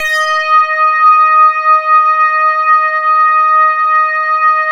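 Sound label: OB8 full blow, OBERHEIM OB8; Big Fish Audio; First Call Vintage Keyboards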